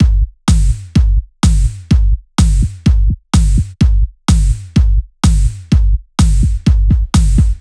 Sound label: 126BPM Cubic Kit; Vengeance Samples